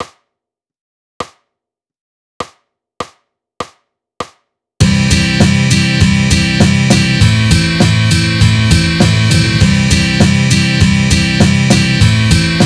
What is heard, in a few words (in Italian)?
Crome ritmo base; ritmica; le crome ottavi